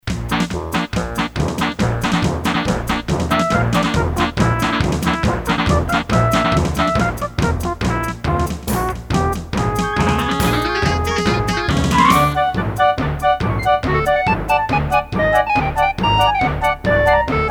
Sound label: Casio GZ-50M; Day of the Tentacle Opening